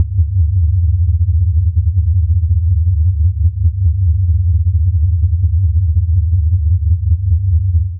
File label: Chromatic Cluster C1-G1; Notes and Clusters